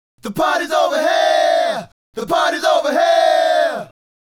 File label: Male Group, Hip-Hop and RnB Vocals